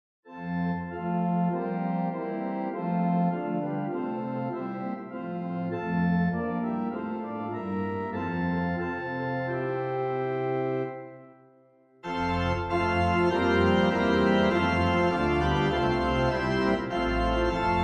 Come Thou Long Expected Jesus Cross of Jesus Organ 4 Verses; Hymnal 1982